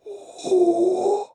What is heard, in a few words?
Groan